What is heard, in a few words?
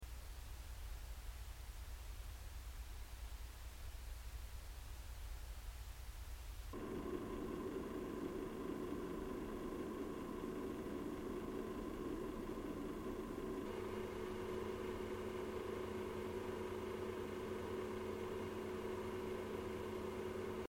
probox empty low filled low; mediasonic